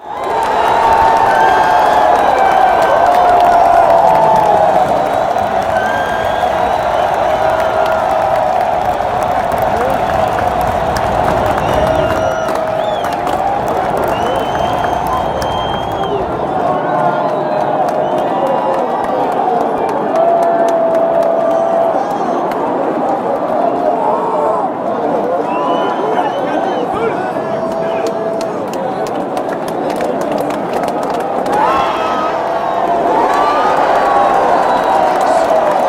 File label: tropics; io tracks circuit; greenhaze